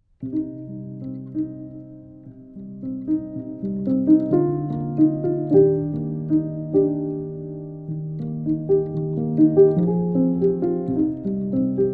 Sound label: upright, schoeps mkh